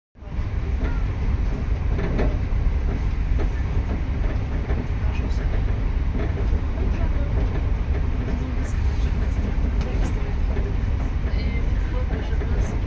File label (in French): Train de Toulouse à Carcassonne